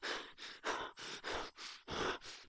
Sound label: Run Grunts; SyrinScape; City gone mad